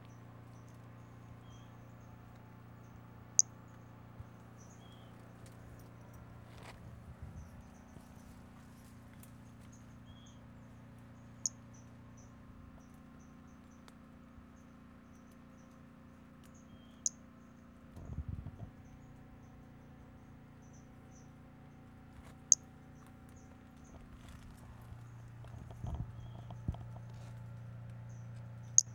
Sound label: Brachycephalus albolineatus